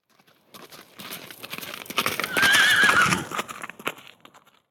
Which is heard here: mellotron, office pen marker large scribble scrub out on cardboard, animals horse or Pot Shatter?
animals horse